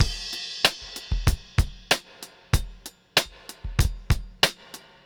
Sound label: Todd 095; Zero-G; Monster Beats